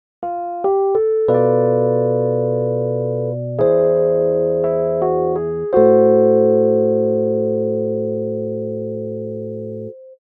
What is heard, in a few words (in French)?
bm7b5 e7s5 am6 melodie; jazz; Ou altérer la quinte (Si devient Do), ce qui ne produit aucun conflit avec la mélodie